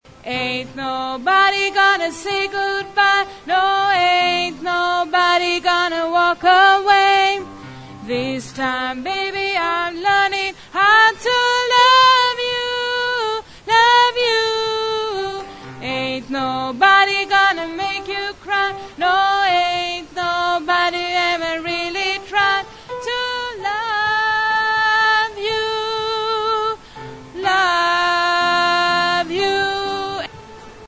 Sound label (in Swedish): bas